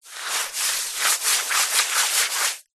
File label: foley wet sand hand movements swirls